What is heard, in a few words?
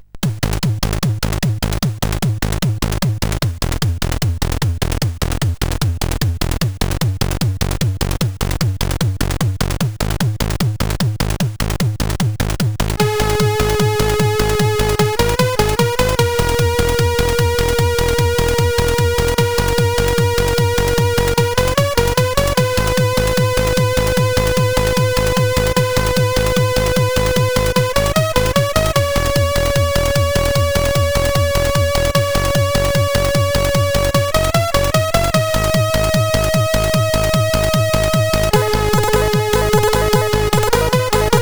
Offbeat Loop, CSG8580R5, SIDwave